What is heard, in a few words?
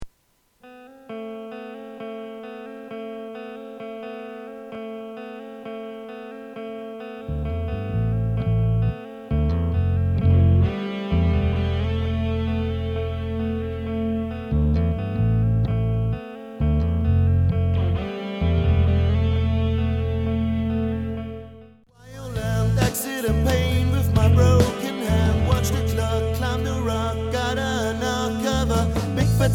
trumpet